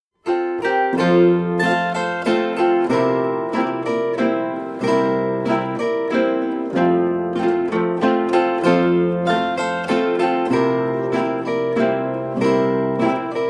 Tanzweisen drei Landler

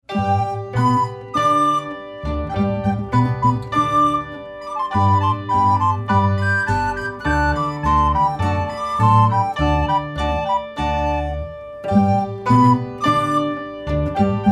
Spielmusik Aufzug

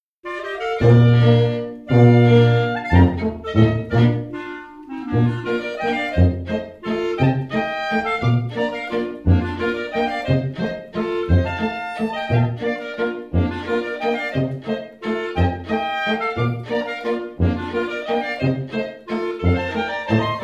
Volksmusik Chiemgau Halbwalzer

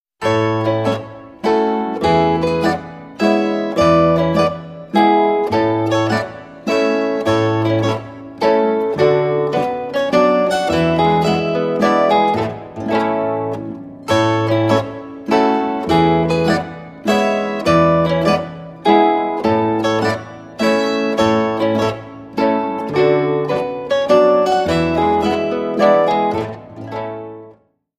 Zitherduo Inntaler Mazurka; Spielmusik

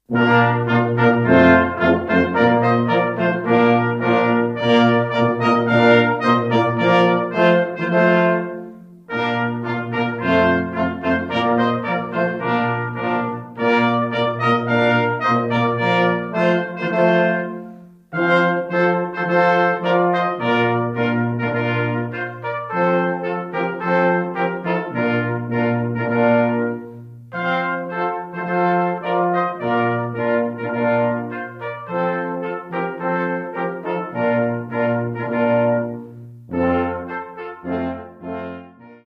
Festlicher Aufzug

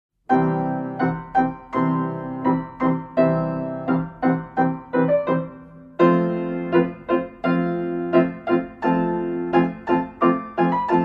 Marsch

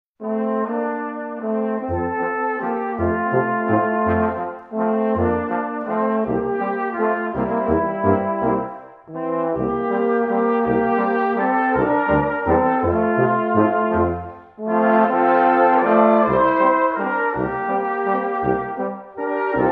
Blechblaeserquintett; Der Weltverdruss

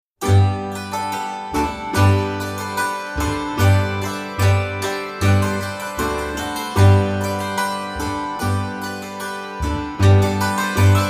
Hackbrettmusik Allegro

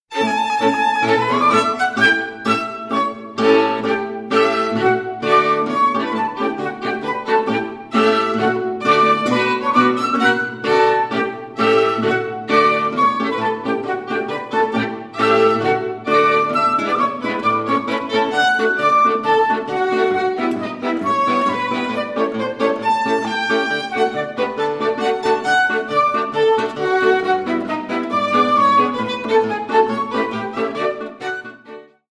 Walzer Galopp

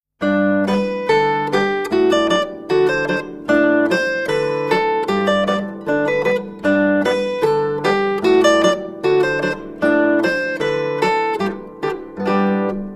Polka

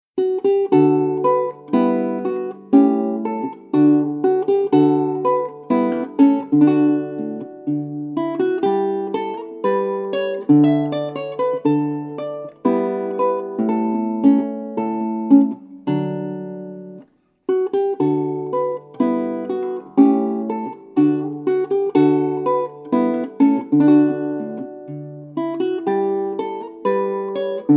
Instrumental, volksmusik

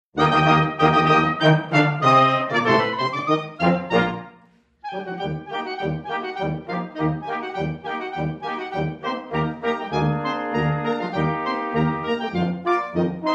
Radezky Marsch

